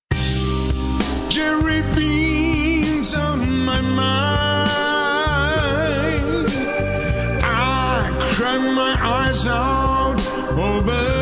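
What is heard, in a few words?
Is Calling R&B, ringtones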